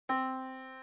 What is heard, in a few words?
yamaha Disk